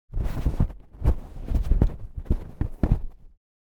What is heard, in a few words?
Car interior foley searching in compartments